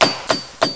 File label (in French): bruit